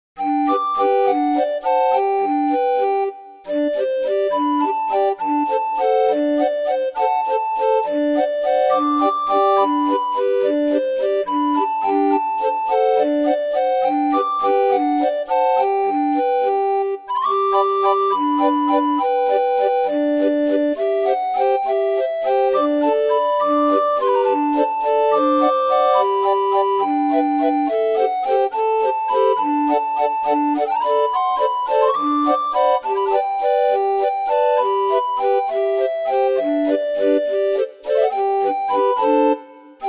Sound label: orgue barbarie